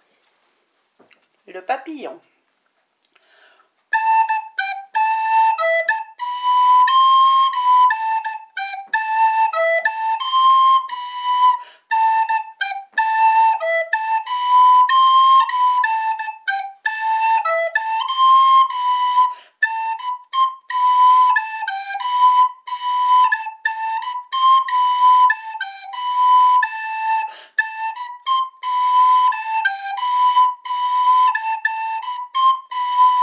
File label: flute